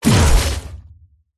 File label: impacts hit impact smash heavy materials; zapsplat